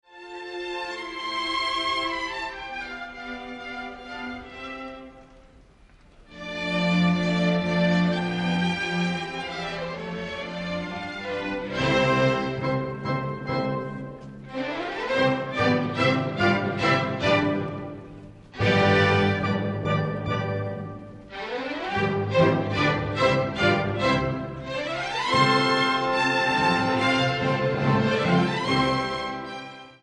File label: Piano Concerto in C major Allegro con brio